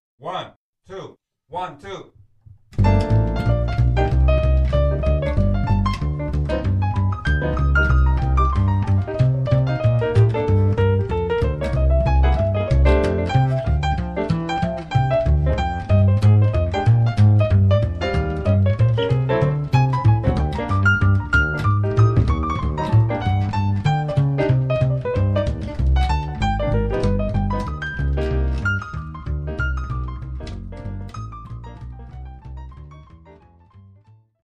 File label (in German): Drums, Jazz Grooves für Schlagzeuger